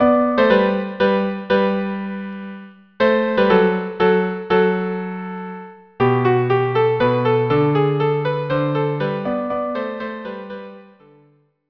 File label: viola cello duo; Rossini; 5 duos